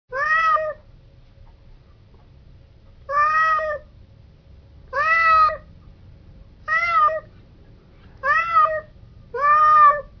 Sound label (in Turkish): Kedi Miav Sesi Telefon Zil Sesi